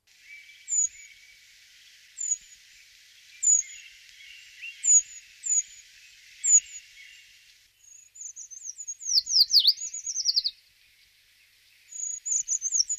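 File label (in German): waldbaumläufer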